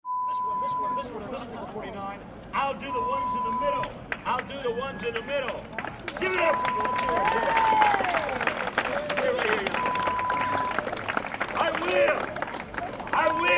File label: Street Performer sound